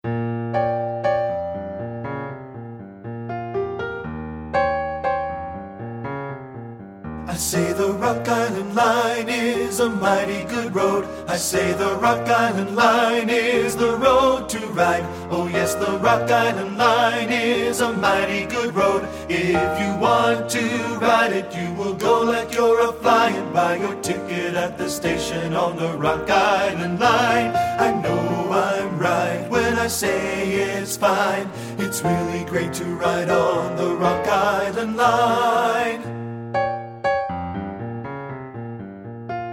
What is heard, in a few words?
BASS; men